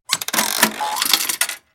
Candy Machine; Comp Of Cartoony Squeak, Boinks, And Clunks, Candy Impact.; Cartoon FX